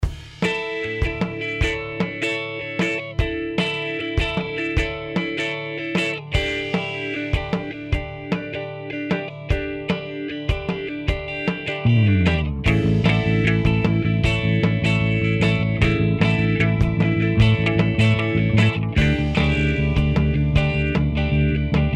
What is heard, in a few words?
kraut